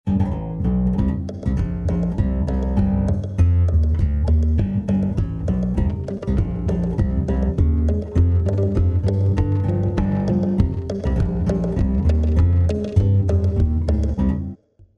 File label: cubist bass